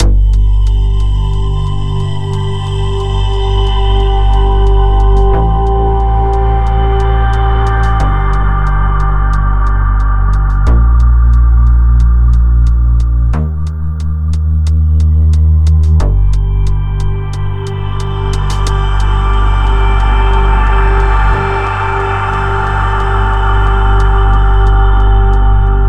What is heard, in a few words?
Tense; everspace 2